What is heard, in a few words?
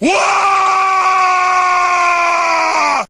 charge orc, Voices Emotes